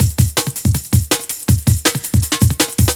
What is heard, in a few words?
Release 162, breaks; minihalfs